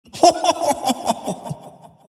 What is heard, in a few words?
crown laugh; dota; monkey king